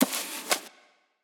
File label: Menu SFX Achievement Book Open